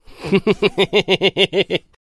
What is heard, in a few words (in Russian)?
kovarnyi smex zlodeya